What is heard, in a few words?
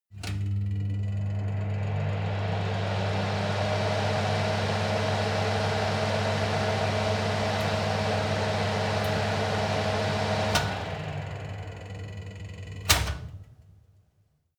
oven running full sequence; gastronomie; berufe